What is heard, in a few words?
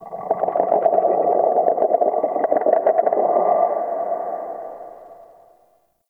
Texture Low Croak; Ambience; Dungeons; Under City